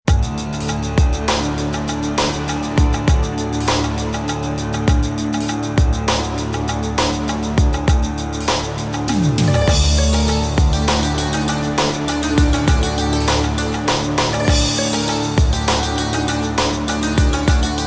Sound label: Desert Tavern Loop; Chrono Clash Fantasy Tactics